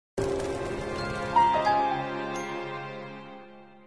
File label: Game over